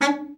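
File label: baritone, BARITON SAX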